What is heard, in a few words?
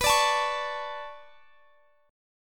B Minor 9th B, chord